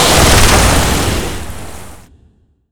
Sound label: dark pact cast; dota; slark